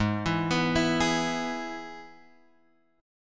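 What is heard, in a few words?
Ab Minor Sharp 5th chord, Guitar, Drop D tuning; down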